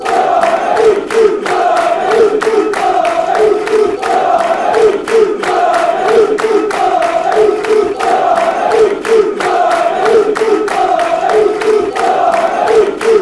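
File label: blue white army, football chants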